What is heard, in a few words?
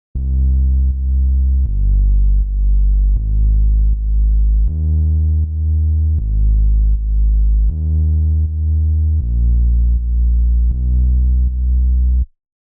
Bass